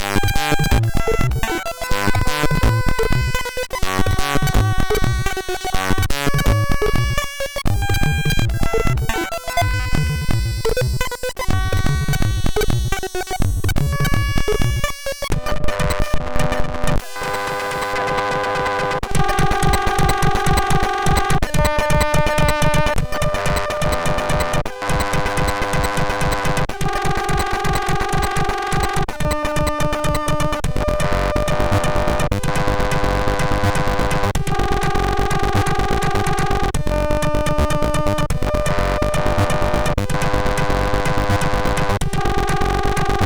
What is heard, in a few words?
sid, MOS6581R3; Hitten6